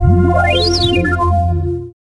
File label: Collect Power-Up; assault heroes 2